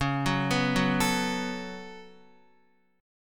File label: C sharp 7th Suspended 4th Sharp 5th C sharp, Guitar; arpeggiated